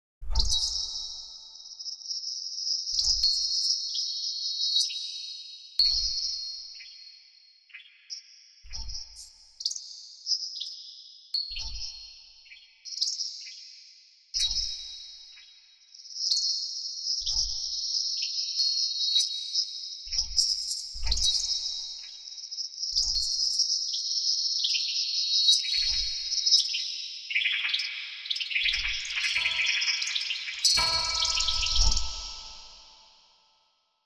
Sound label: Playing